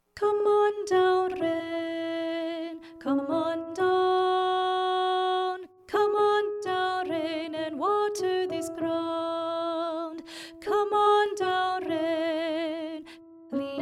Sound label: alto